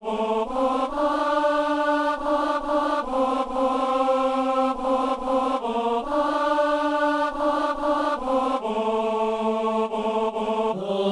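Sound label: tenor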